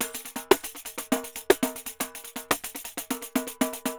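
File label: pandeiro, DRUMS SAMBA; Best Service ProSamples vol.14; AKAI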